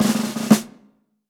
BGM Attack; Clubhouse Games: 51 Worldwide Classics

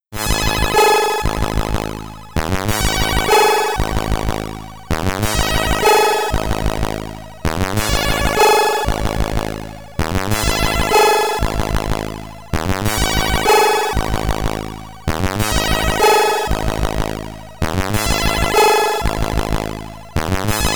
BGM (SID Stereo), Commodore 64; Championship Run

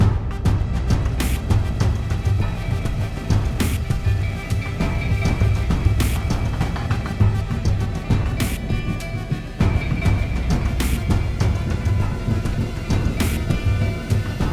Music Gameplay State Melee; Sifu; H1 Level Area=Building2 1stPart

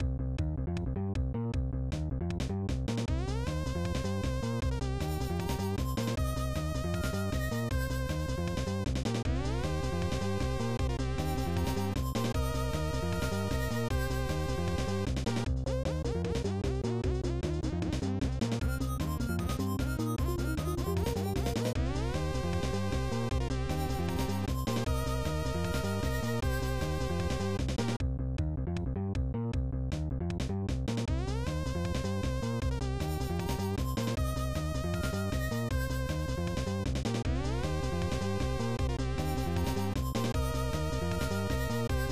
Level Music; Clock Works; SNES

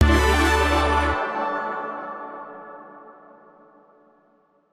Upgrade Fanfare

